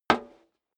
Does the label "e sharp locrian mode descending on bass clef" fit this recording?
No